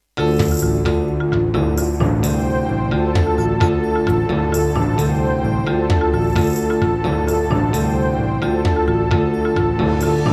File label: Serdaco MP32L GeneralUser GS Bank, wavetable; Heretic